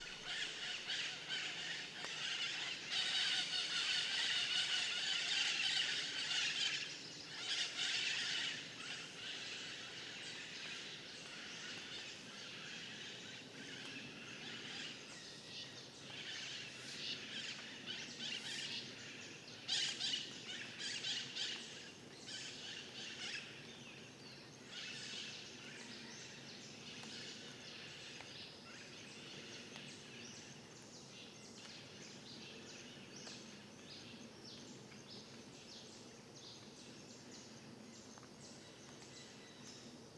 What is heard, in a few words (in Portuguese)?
Amanhecer dentro da mata em Terra Ronca; colecao sonora do cerrado